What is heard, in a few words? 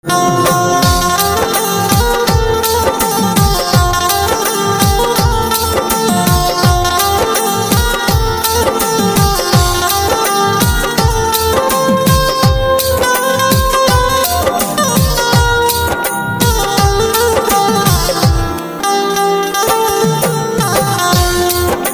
Banjo Instrumental Ringtone